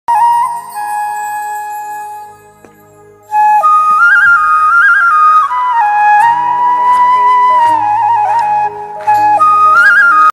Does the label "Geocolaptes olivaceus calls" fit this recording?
No